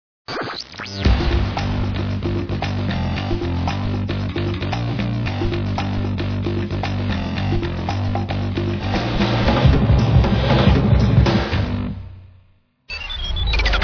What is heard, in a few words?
pop rock positionner